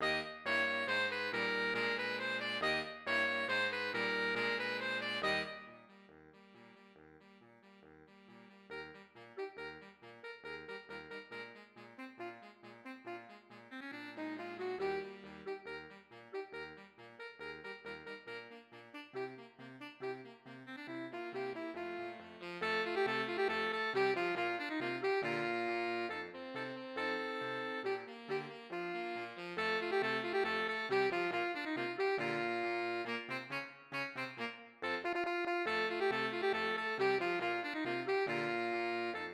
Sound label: polka; tritsch tratsch polka; strauss; kendor music